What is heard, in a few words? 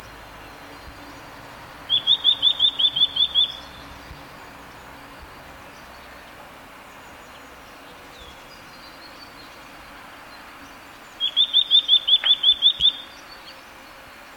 Eurasian Nuthatch Sitta europaea caesia